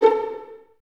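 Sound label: violins A4 pizzicato, String Ensembles; Miroslav Vitous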